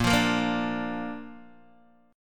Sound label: A sharp Major Guitar DADGAD